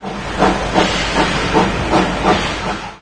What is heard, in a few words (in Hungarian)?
Mozdony; Pure Pinball